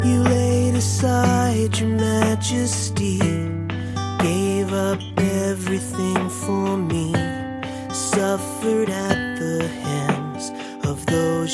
sad english song tone ringtone

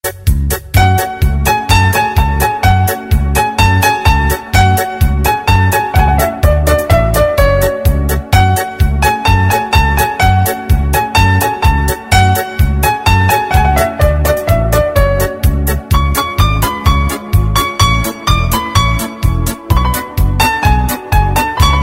instrumental ringtone

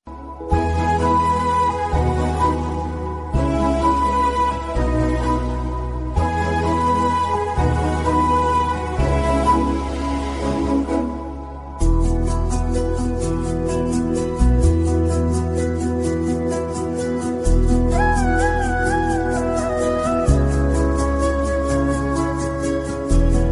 romantic background flute music tone; galaxy ringtones

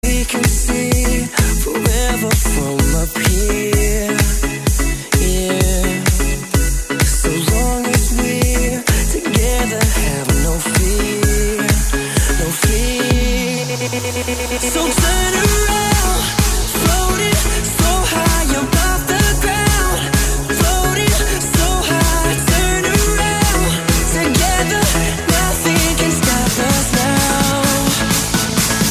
party mix song tone ringtone

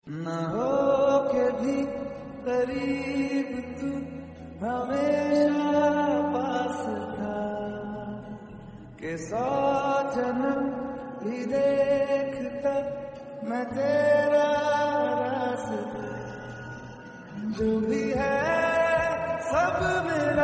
hindi song tone ringtone; bollywood

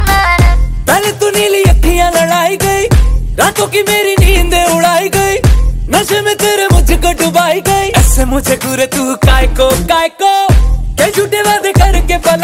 bollywood remix music song ringtone

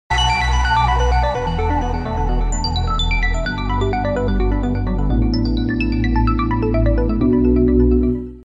samsung s7 edge notification ringtone

